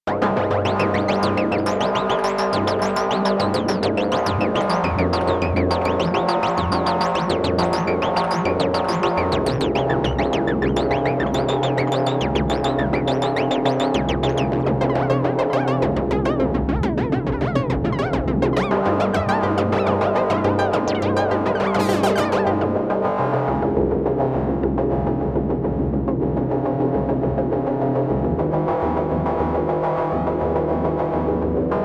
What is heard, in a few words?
cross modulated arp